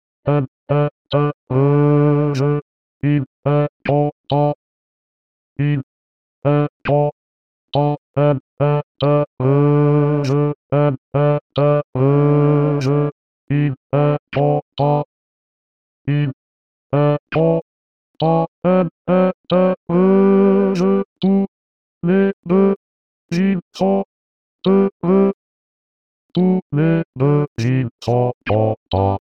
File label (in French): Basse; operette gaie